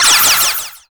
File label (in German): magische Attacke mit eine; mmorpg